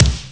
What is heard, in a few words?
bass drum; Amiga Soundtracker Sample Packs